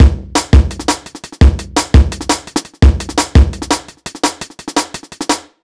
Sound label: E Beat, Electronic Beats; KJ Sawka; Drum n Bass & Beyond